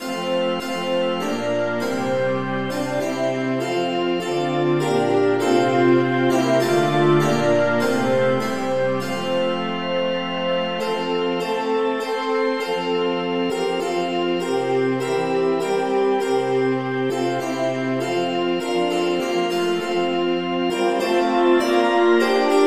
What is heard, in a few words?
polyinstru; hymns; Himnario grande